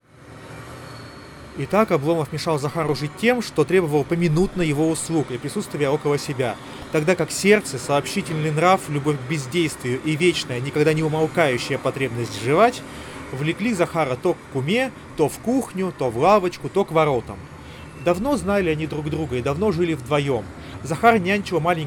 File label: Recorder noise; realme buds air 7 pro